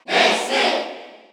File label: Cheer Korean; Ness; SSBU